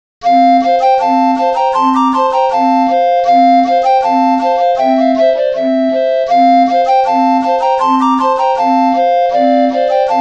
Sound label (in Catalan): Ball Ingles vell (flabiol + bombo); festa